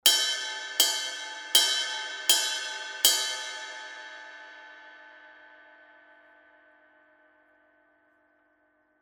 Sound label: zildjian medium ride bell hits